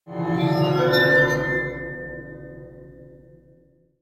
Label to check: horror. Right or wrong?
Right